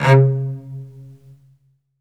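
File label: SOLO cello, Strings; ILIO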